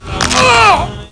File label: yell; Nukem3d